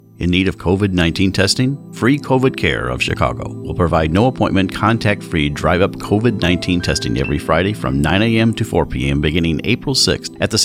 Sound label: spot music; Free Covid Testing